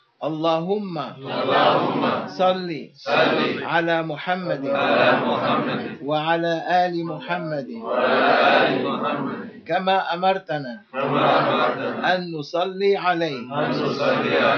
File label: Salawat recite 71 times; to see Prophet